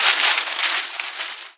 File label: paper fly; Angry Birds Seasons